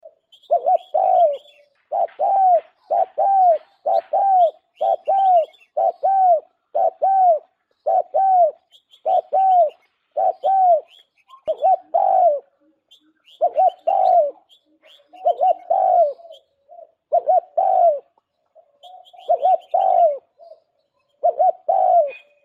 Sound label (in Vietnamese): Tieng Cu Gay moi chuan